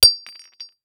Impact Ceramic Hits Concrete

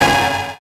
Buttons Mechanical

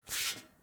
FOOTSTEP - Metal Plate Slide Barefoot Male; Barefoot on Metal; Timothy McHugh; GDC Game Audio Bundle

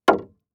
Liquid Water Water Metal Hit Metal Underwater

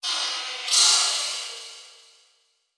Transition Hit Impact; Production Element

